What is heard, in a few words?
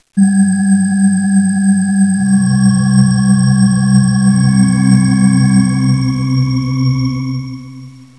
space